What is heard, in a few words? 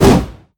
whoosh